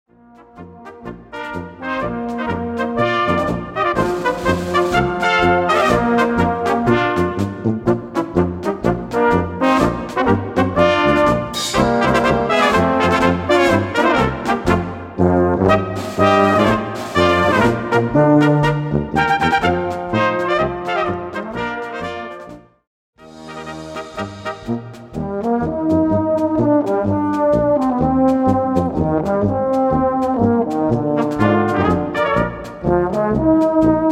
Marsch

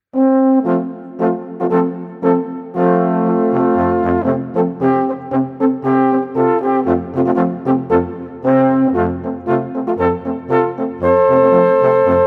Marsch

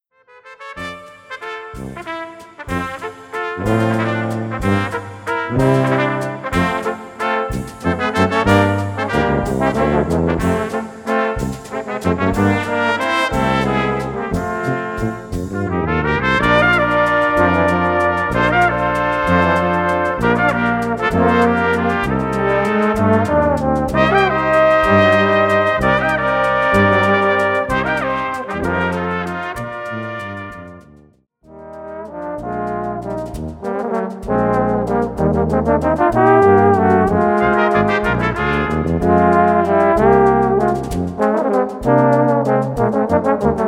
Walzer

